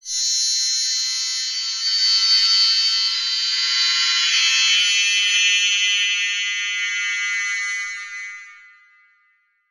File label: Transition Communication Distortion Scanner; Production Element Title Transition